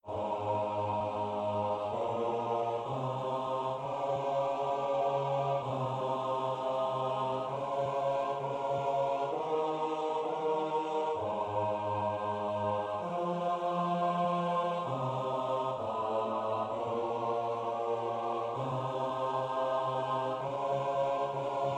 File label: Psalm bass